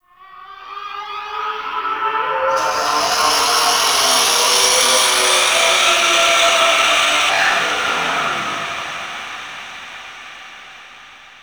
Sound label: Shrill Screams; Ambience; Man In The Wall